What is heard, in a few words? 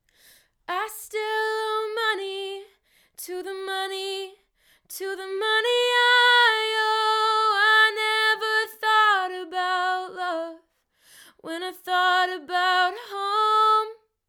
Hyper X Quadcast S singing